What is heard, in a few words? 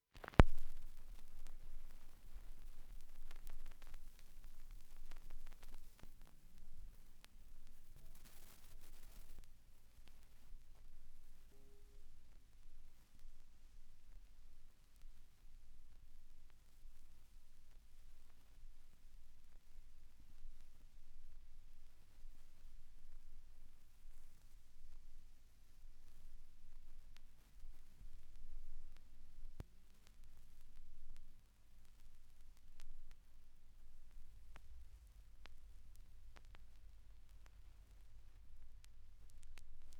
Surface Noise after Plays